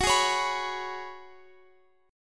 F sharp Suspended 2nd Suspended 4th, Guitar Open F; strummed; x,x,18,15,16,18